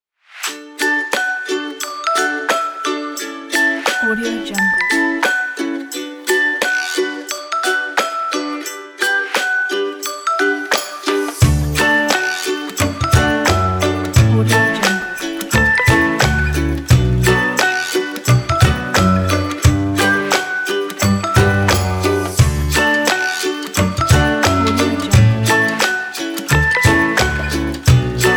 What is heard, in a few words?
happy ukulele music